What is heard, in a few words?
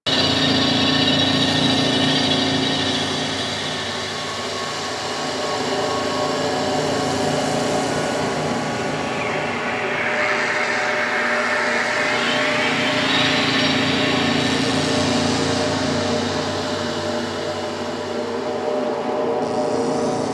Outpost Interior Amb; Warframe; Corpus